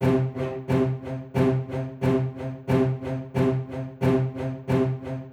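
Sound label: Walking Spiccato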